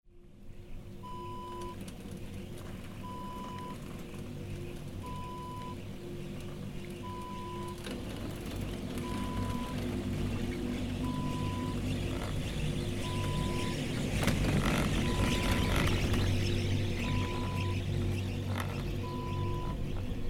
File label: ski chair lift ride